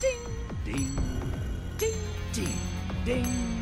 Ding; Gambit

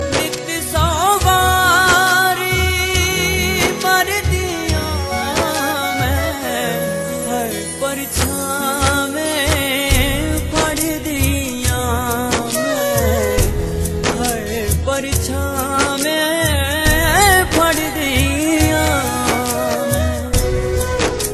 Punjabi

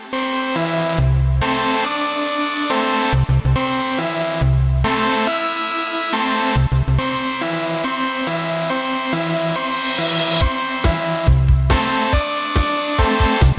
Electronic Chicken

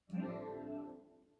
save sound; Gt3

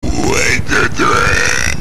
Burps

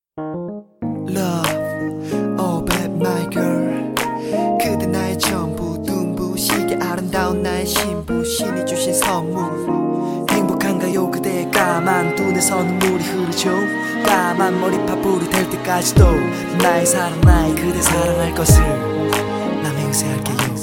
Rap Part

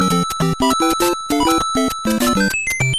Coin

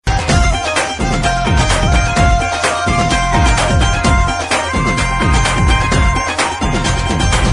Bhangra Sms

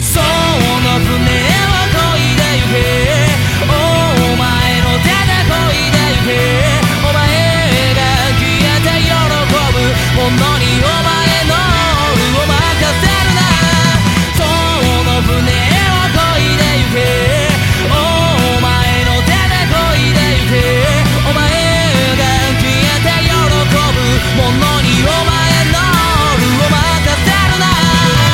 Chorus

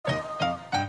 Oh My Piano

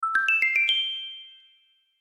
Text Alert

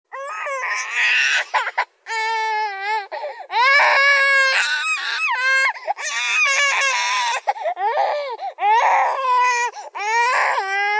Fone Criying

